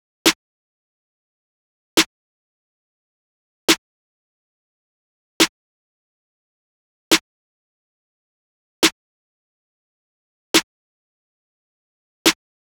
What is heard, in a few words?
Drum Loop - 140 BPM Snare; Loop Stems; Cymatics; DOPE DRUMS VOL. 1; Pluto